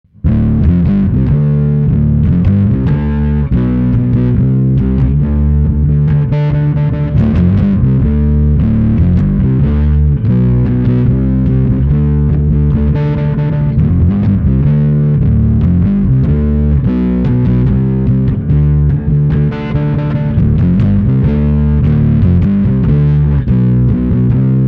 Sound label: oktup dist